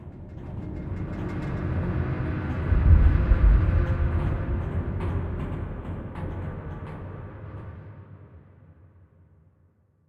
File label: submarines engines; World Of Warships